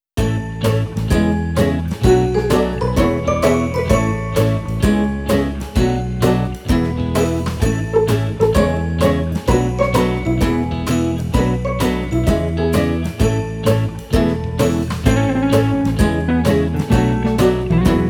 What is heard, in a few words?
house electronic techno; By Burak Sound Bank